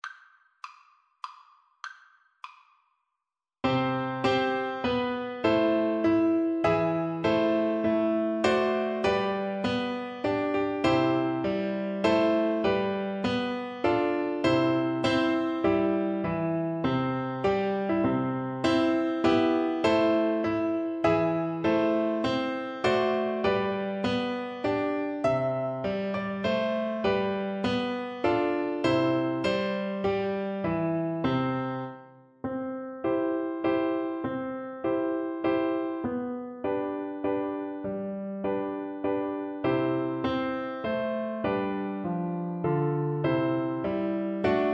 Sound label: horn, French Horn